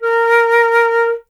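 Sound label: flute A#; Miroslav Vitous; Solo Instruments VOL-2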